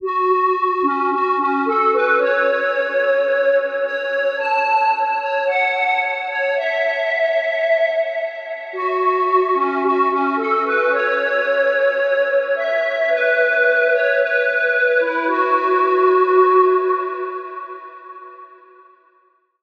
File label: Woodwind; Routed Sound; Spire Expansion - Story Walker Vol.1